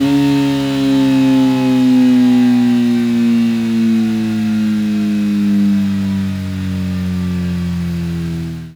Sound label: Motorcycle, Engine Disengage; Warframe; Vehicles; Motorcycle Ninety Nine